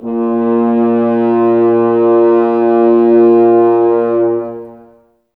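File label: Brass French Horns, Woodwind Brass Ensemble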